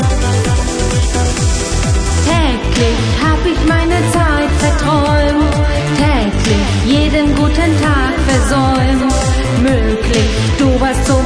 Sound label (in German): Viola Dornröschen ist aufgewacht